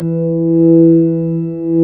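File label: ORG B3 E 3, organ; Best Service Gigapack 2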